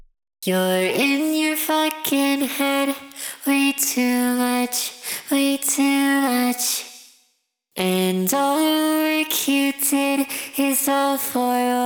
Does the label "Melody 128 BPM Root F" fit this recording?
No